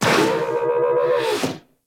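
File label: Research Facility Repair Robot Rotate; Warframe; Venus Open World; Objective Rooms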